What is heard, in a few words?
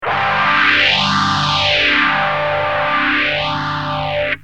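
guitar DOD phasor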